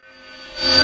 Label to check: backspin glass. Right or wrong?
Right